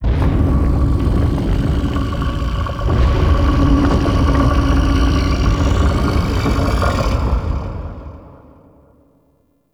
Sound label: Shaking Lab Rumble; Ambience; Gameplay; Warframe; Entrati